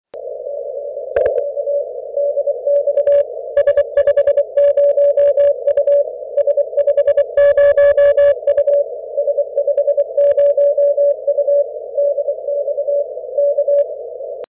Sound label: 160M CW